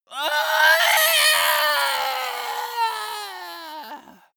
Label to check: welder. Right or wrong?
Wrong